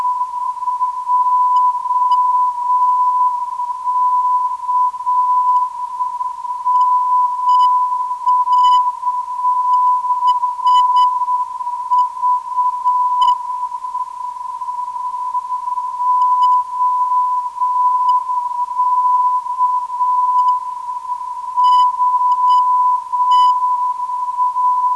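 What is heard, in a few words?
310kHz, non-directional beacon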